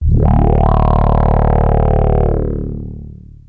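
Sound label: MOOG SWEEP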